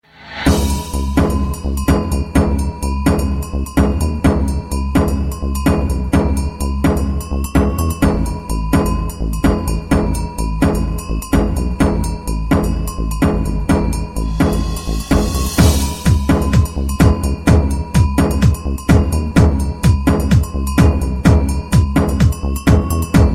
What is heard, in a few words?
Club